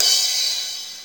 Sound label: DP50 Crash, Technics PCM DP50